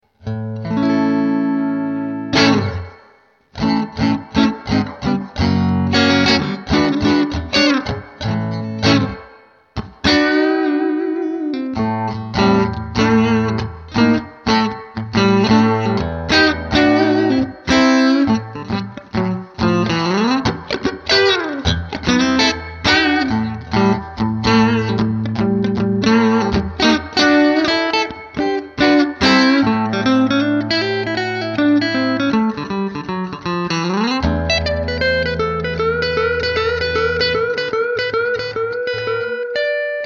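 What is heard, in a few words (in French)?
Neck - Middle + Bridge en phase, micros, strat-like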